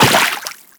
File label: Run Water, Footsteps; Warframe; Teshin